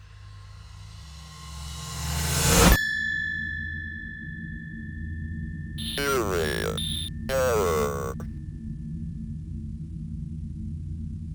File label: Serious Error